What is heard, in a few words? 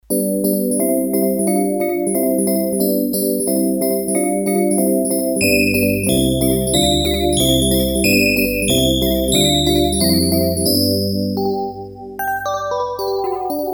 Jingle Keys, Korg DS-8; Factory Patches